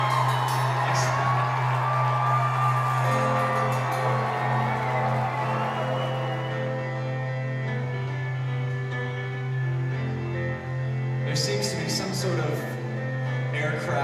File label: Plane Landing